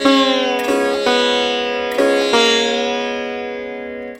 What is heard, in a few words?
SITAR LINE, SITAR MELODY; Best Service; World Colours